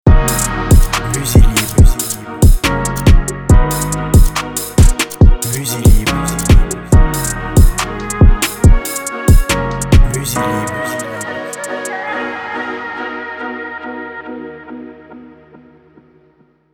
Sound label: ninja drill